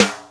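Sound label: R8-SD, snare drum; X-Static Goldmine 1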